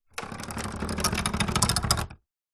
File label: musical keyboard synthesizer fingers run along keys turned off